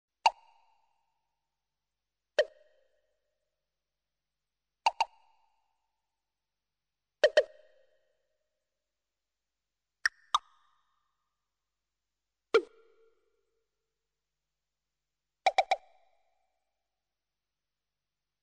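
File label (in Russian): смешные звуки похожие на капли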